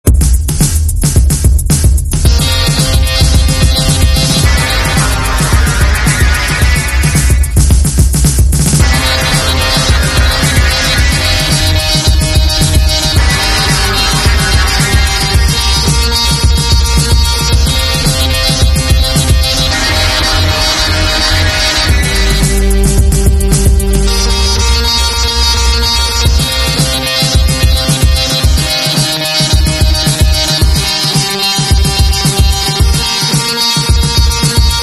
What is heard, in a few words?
Arp bass Solo gytar